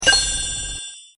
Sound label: UI card; Paradise